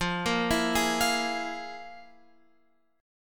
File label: F Minor Major 7th Double Flat 5th, Guitar, Open F; FmM7bb5 arpeggiated; x,12,10,11,11,12